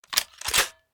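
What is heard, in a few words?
Weapon anti material rifle reload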